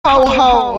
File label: howl howl